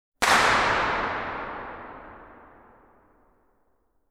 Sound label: Sound Right Transient Attack And Reverberation; Kharma Test & Calibration CD